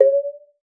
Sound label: WATER; Xsample VOL-9